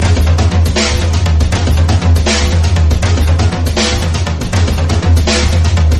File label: drums; horde